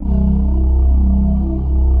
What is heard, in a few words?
CHOIR C2; Greytsounds; Scott Peers Sound Engineering